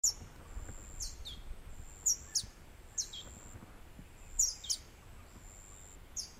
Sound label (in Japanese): hoojiho